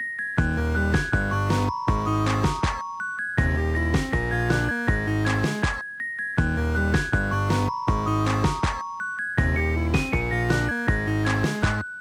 Icecream Truck; Lethal Company

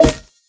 Caisse Eclat

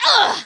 Barrel Bail Female; Kelly Slater Pro Surfer; macOS, Windows gamerip

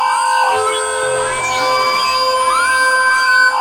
Noyau

